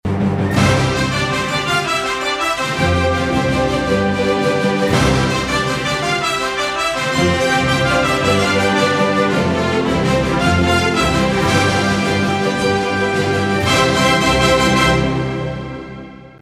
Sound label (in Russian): Фанфары